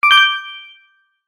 multimedia game sound bright positive sparkle

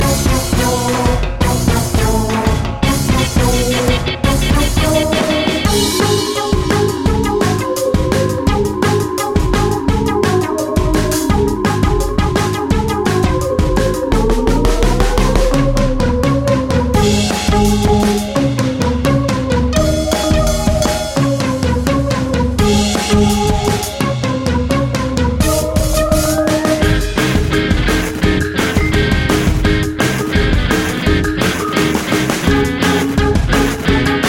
Bomber blues; bomberman live battlefest

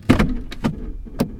Gear Shifting

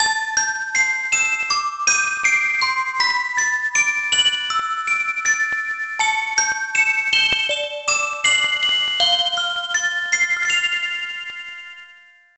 Baby Musicbox; tamagotchi connection corner shop 3 ds

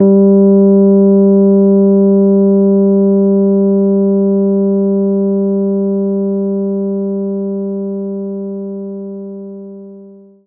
Rhodes G2; knights try

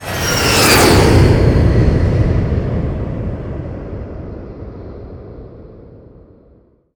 Vehicles Jet Flyby

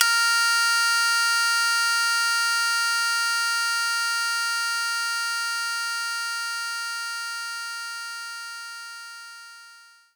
Shamisen C3; Knights Try

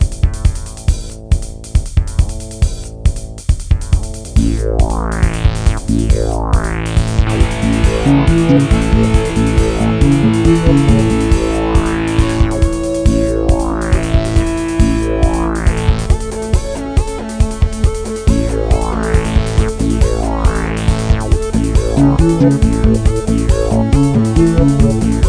Loading Screen; Backyard Hockey

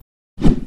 Axe

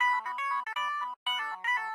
Ring

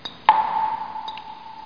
Cave; Buggy; PS1

